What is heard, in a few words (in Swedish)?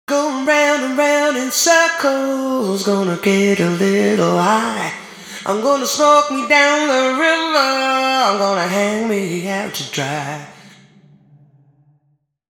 vocal ir trapphus trumstockar ortf; Trumstockar, trapphuset i Kopparhammaren 2 på sång, HT2016 - trumstockar